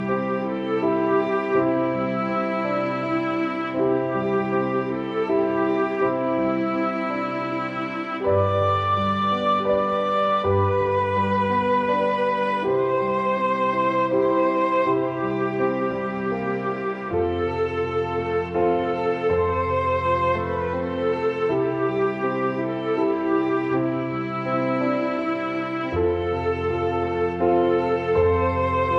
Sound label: Xmas Instrumental